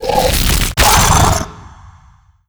vox shockwave tell, zombie revived

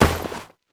snow, player footsteps

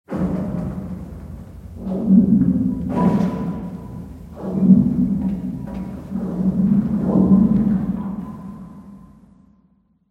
amb lowpass ping, ambient; Half Life Model Pack

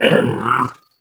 ballbot facepalm; Portal 2

